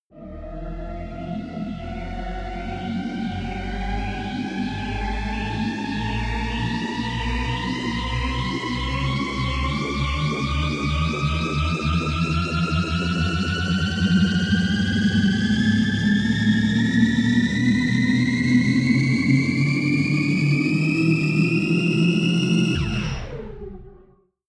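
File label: detuned synth orchestra, drones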